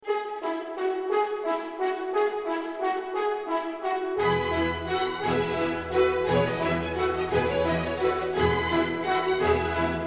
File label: Carillon; G.Bizet